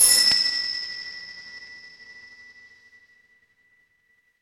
instrument bell

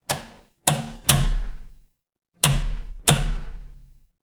mechanical switch

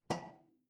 glass ceramic

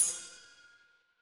instrument bell

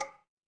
glass glass tap

